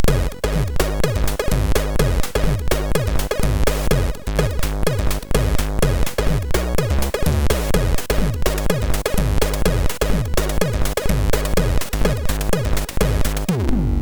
Kashanka Beat, MOS6581R4

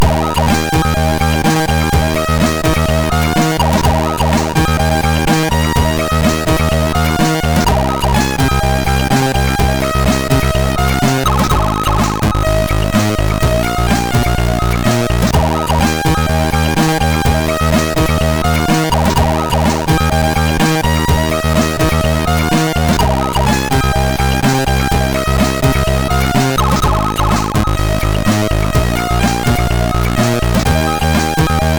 I Wanna Dance, MOS6581R3; HVSC

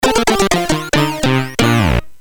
Jingles, CSG8580; CSG8580R5; hvsc

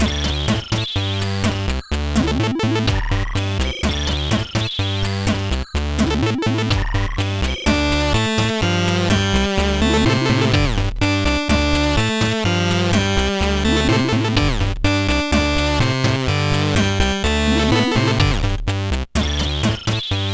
Loop, sid CSG8580R5; Mojo

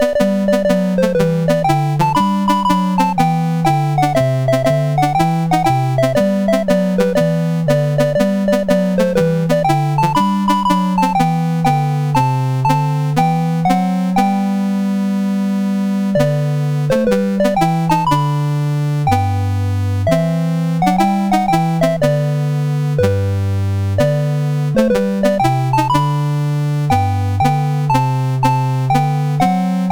Battle Hymn BASIC, CSG8580R5

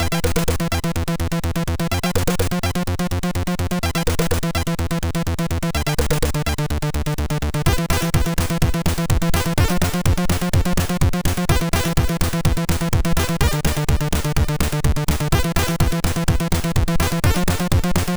Techno, sid MOS6581R4

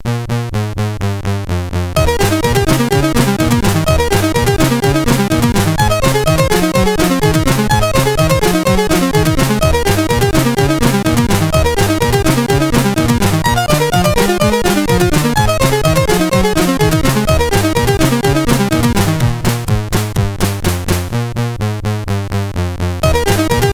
Rock and Roll, sid CSG8580R5; Signor

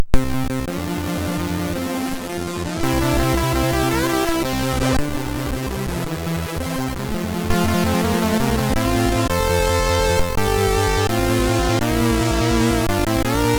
Happy Birthday sid MOS6581R3; hvsc

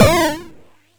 SFX, MOS6581; Narco Police

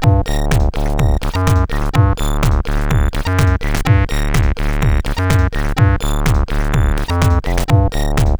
Orange Electron CSG8580R5

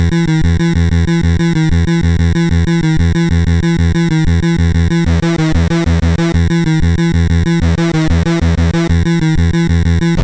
Techno, CSG8580R5; Techno 3; Krwiak

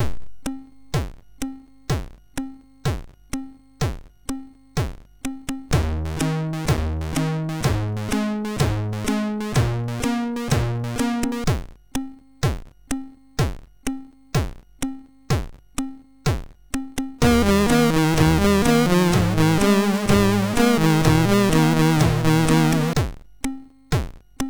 Sudan Rythm, MOS6581R2

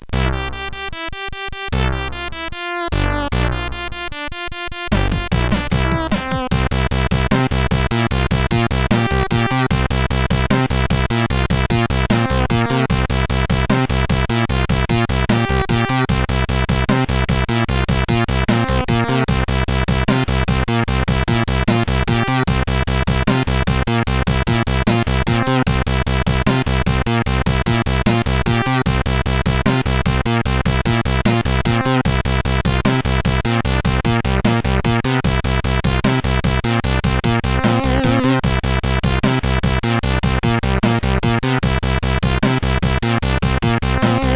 Folk, CSG8580R5

